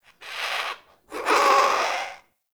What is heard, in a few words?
Amb Corrupted Bird Close; Ambience